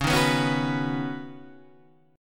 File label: D sharp Minor 7th Sharp 5th